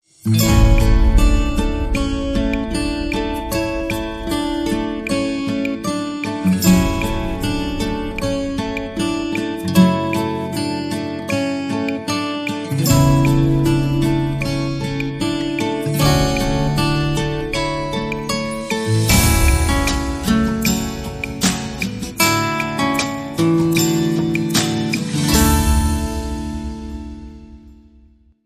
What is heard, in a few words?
Guitars and Percussion